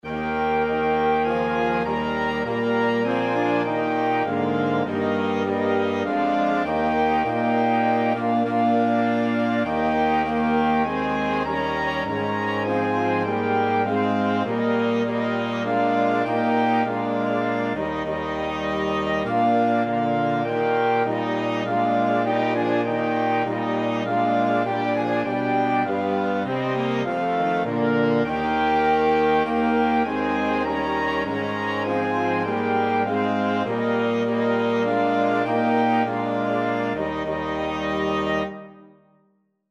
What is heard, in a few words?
flexible mixed ensemble, 4 players